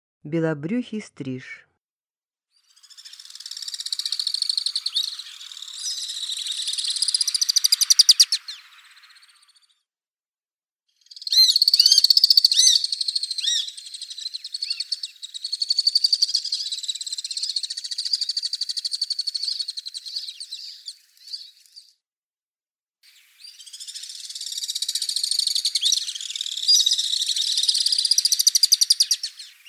belobryuhiy strizh golos

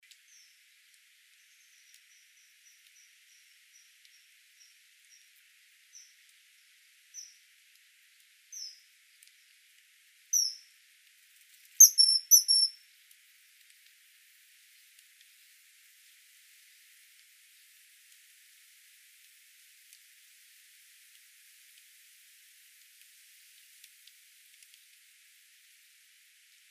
obyknovennyy zimorodok golos

